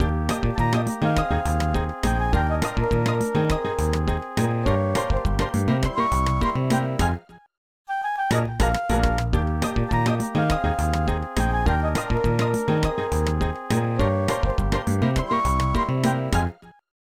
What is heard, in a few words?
Music Vibe Island